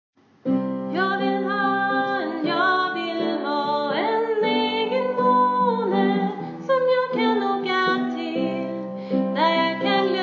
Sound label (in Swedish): Stamma